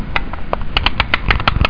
Wind it Up

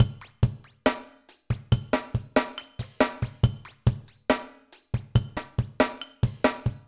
Industrial Techno C5; syntonic generator

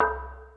DING C4

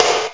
snare

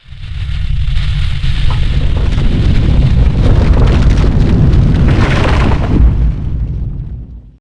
bug open, bugs; Starship Troopers

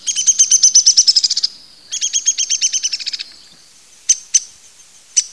Downy Woodpecker, bird; Woodpeckers; Bird-Songs

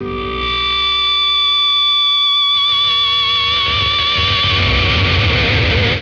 Wild Distortion

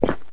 step asphalt; SpecOps2 MPlayer Demo

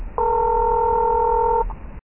Modem Connect; ProOne: Netcracker Netscape Navigator

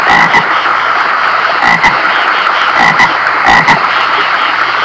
pond creatures; nature; Sound Effects for Multimedia - Volume 1

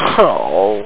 Humph, death; Revenge; deathfx

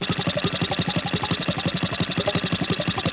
Mach On; Bible Fun Pack; NOAH

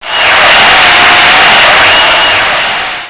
Applause; Mac Football Manager 2.1

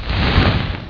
Burning; Bugs Bannis; Squish 2.6.8

